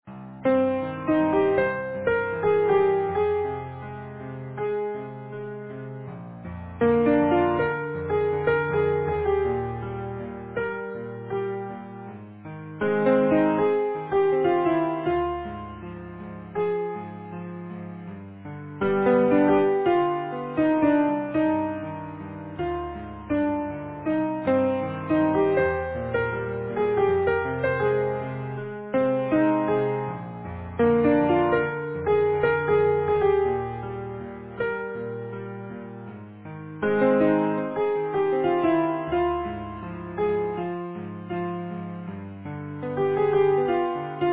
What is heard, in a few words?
Easy Piano